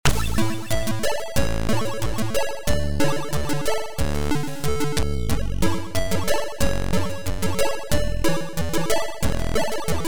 Title music; Rustles Land 2; the land of rustles 2; arcade; classic platformers

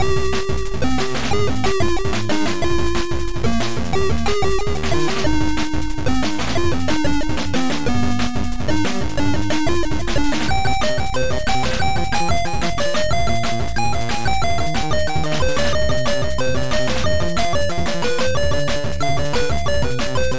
TechnoBeat

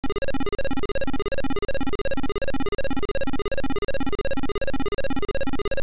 Intro Beeper

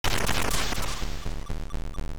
Title AY; Hawk Storm

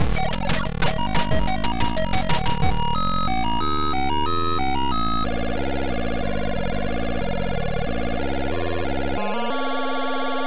SAMBA

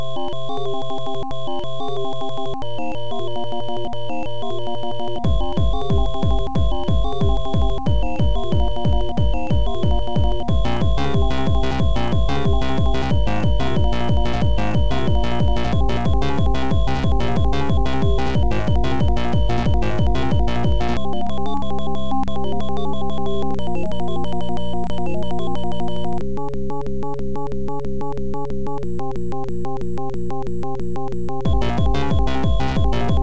50Hz

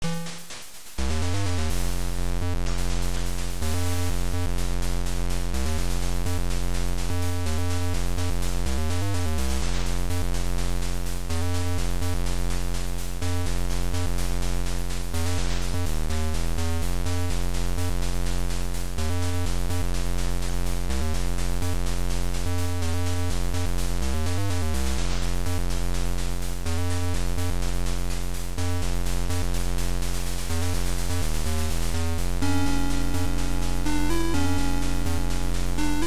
Title In Game AY; Tarann Ltd; Jon Paul Eldridge; Afterburner

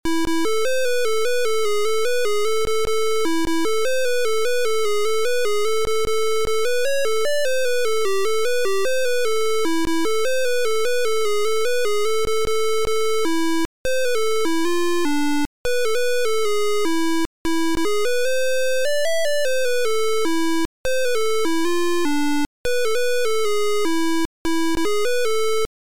MELODY; beeper

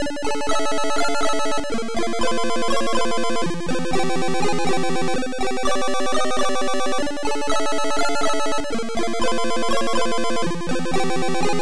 RAIN

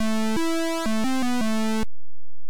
Title, Beeper; Fire Lord

